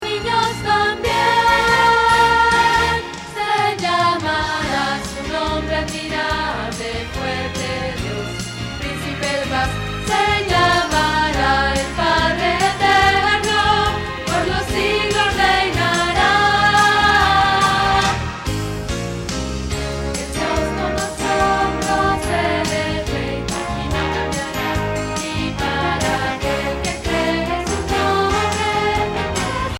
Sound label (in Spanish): Contralto